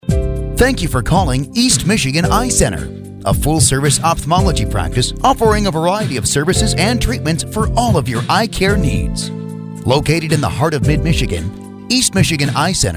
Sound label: On Hold; EMEC